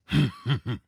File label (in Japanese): 攻撃